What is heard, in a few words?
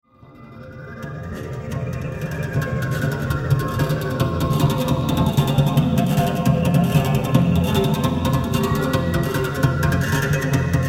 oriental wind